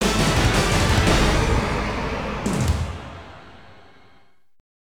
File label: The Loser (Lose); Avengers in Galactic Storm; deco mlc system; arcade